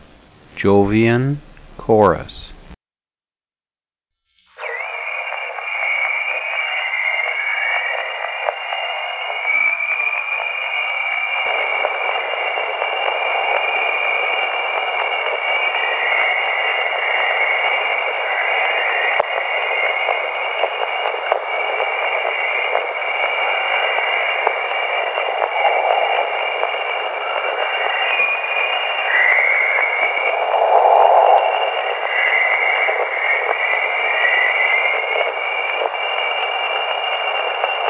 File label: Jupiter Chorus; space-audio